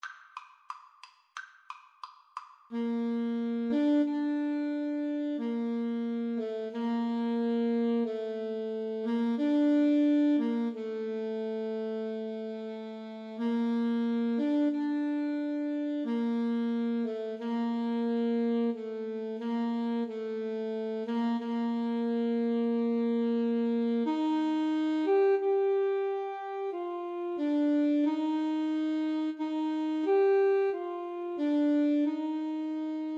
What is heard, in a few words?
largo flute sax, Flute Saxophone Duet